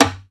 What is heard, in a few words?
CLSD RIMS, Timbale; Timbale Battery